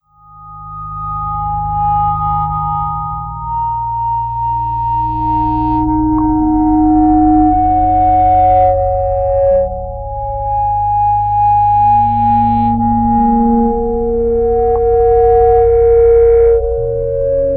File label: Melodic; Sonic Foundry (Sony Creative Software); Slow Silhouette